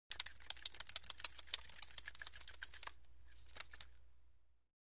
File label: Fishing Fish Flippi